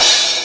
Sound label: soundlab crash